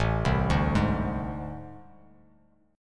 A Minor Major 7th Double Flat 5th, down, Bass Standard; arpeggiated; JGuitar